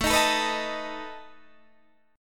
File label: A Suspended 2nd Flat 5th, Guitar, Standard; Asus2b5; strummed; 17,18,19,16,16,17; JGuitar